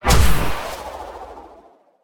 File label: Ball Cannon Fire; Deathrun